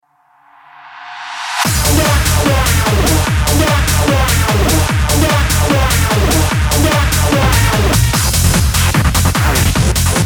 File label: Hoover